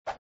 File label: swoop backward